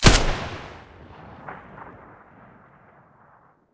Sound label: ash12 muzzle mono, weapons; STALKER; IWP